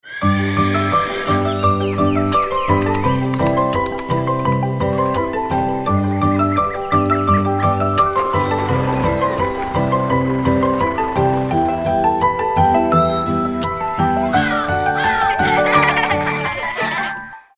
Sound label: Ringtone; Averbeck; Landlümmels-Version